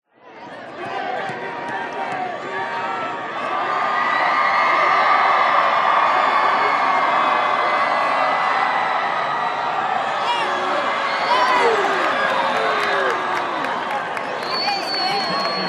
american football crowd touch down mixed reaction distant cheers close boos